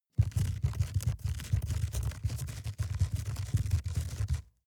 rat run trample fickle

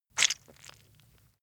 leather impact mud soft squeeze

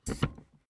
office chair hydraulics down stepwise

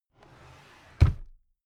drawer cabinet carton close regular

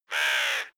crowing

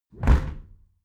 window close heavy

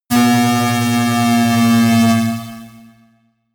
bass drop slow short

